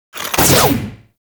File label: Ninja Stab Attack Action, Foley; Tau Dax